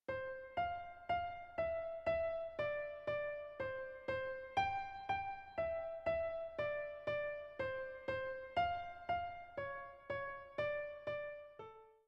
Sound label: Brahms Feldeinsamkeit Melody